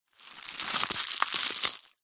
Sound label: leaf dry crispy crunch crush